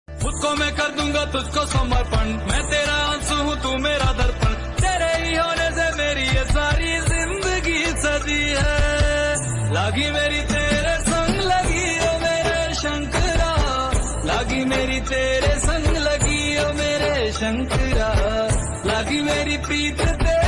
Om Namah Shivaya Bhakti Ringtone

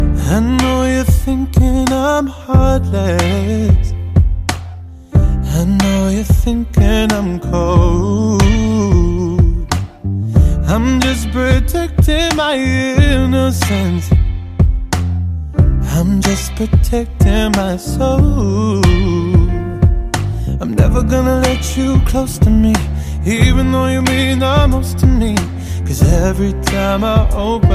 Hollywood Song Ringtone

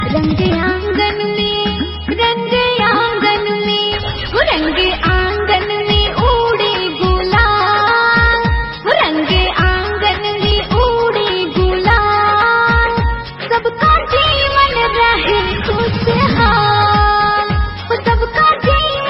New Year Ringtone

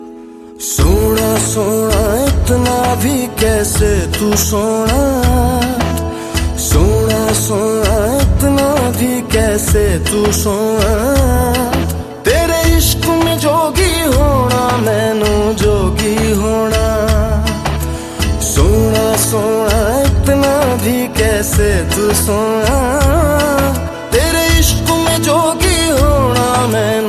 Hindi Song Ringtone

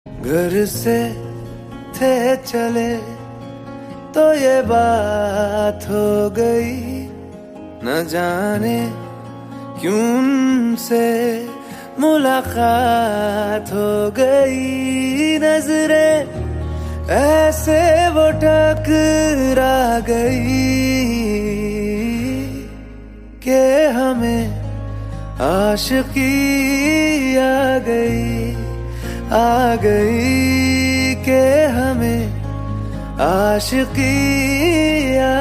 Hindi Ringtone

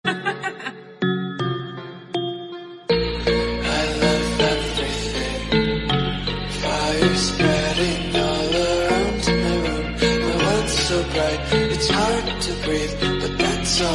Remix Ringtone

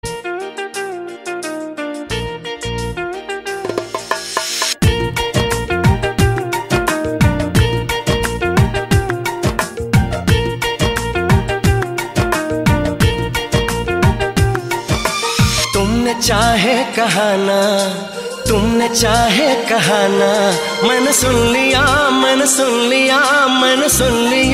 Classical Bollywood Song Ringtone

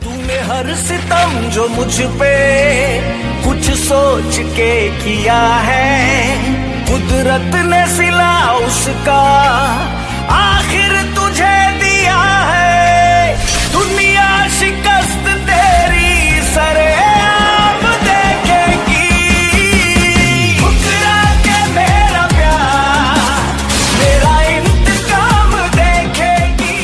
Sad Song Ringtone

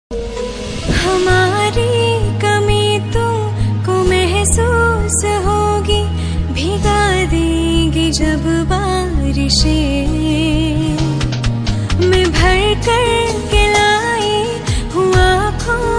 Female Ringtone

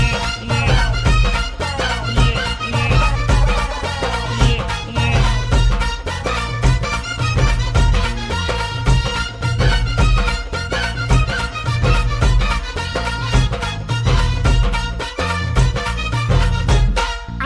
Instrumental Song Ringtone

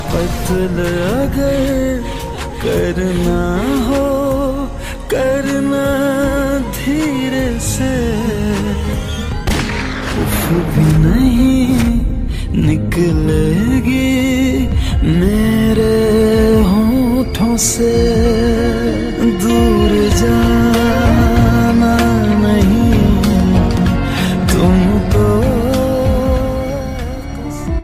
Sad Ringtone

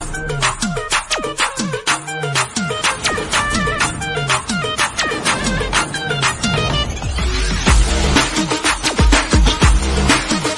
Bgm Ringtone; ringtones; Don Movie

